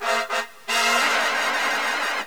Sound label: shake